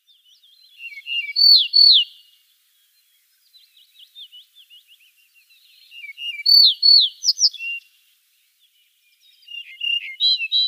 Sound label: Yellow-breasted Bunting song